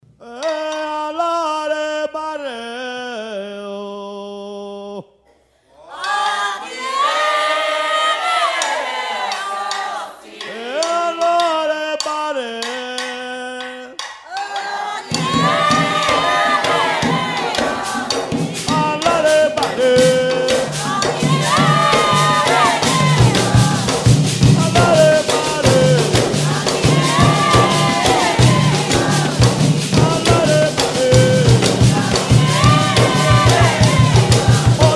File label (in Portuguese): toada para ode